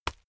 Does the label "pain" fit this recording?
No